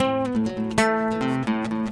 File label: ACST GUIT ETHNO 125 A, Guitar; eJay